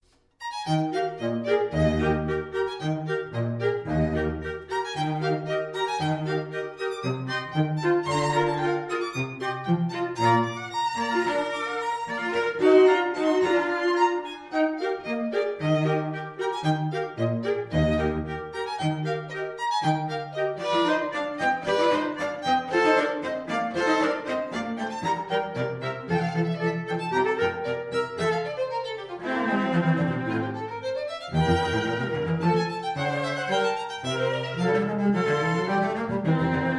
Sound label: trio